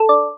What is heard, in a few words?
kling; speech; tts